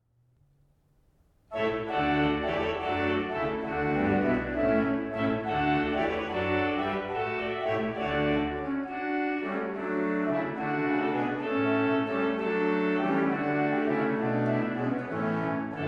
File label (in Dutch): improvisatie, kerk; opeinde; gerkerk